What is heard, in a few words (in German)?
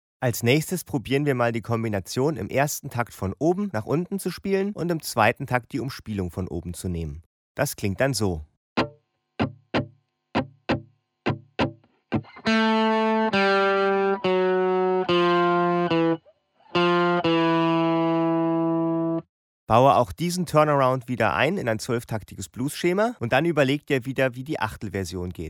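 Turnaround abwärts von oben; Turnarounds; Turnaround 2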